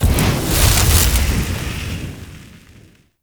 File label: Forcefield Border Start; Objects